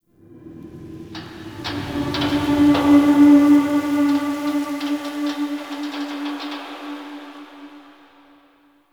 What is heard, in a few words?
Zariman Park Wind; Warframe; The New War; Ambience